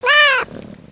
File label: Pet Cat Meow; creatures pets